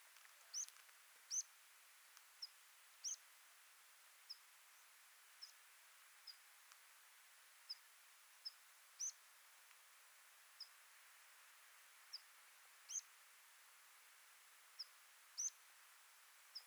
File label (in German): Bergpieper Flugruf